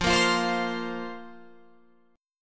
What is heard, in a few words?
F Suspended 4th F down Guitar Open F; Fsus4; strummed